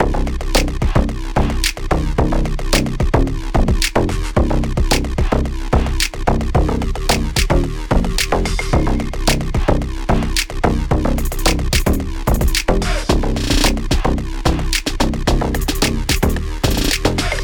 Loop An Intense Cinematic Track For An Active Episode Of Your Movie